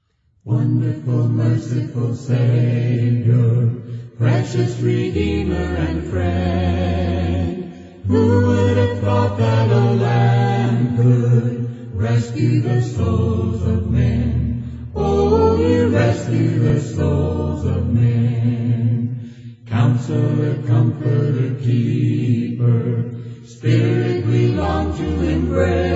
a capella hymn, -

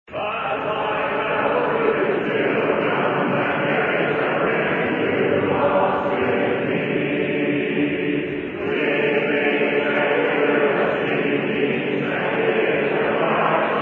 a capella hymn; jesus hold my hand

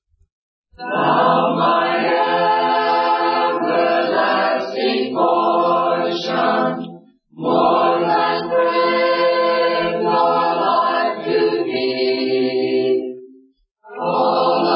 a capella hymn

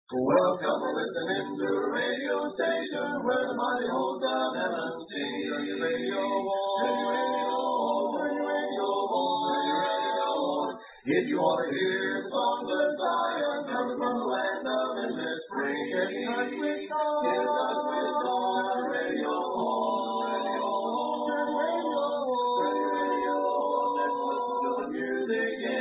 a capella hymn

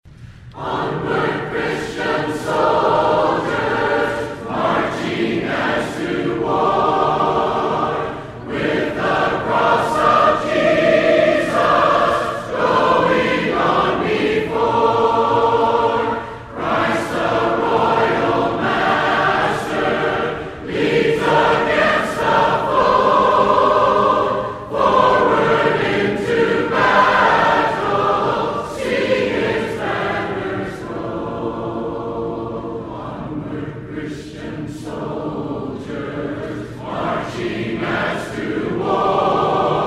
a capella hymn; onward christian soldiers

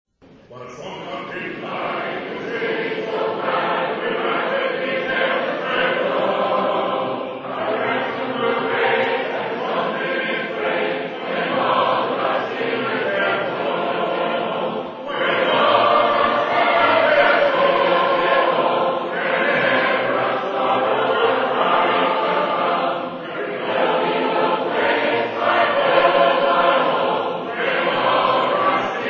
a capella hymn, when all of gods singers get home

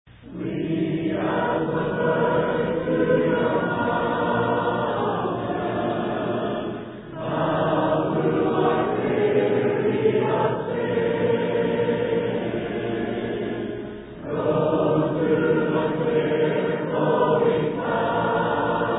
a capella hymn; flee as a bird

